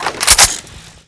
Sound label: m4a1 deploy